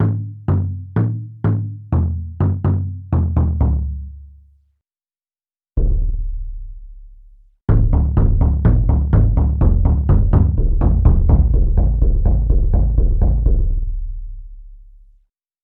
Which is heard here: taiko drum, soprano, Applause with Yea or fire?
taiko drum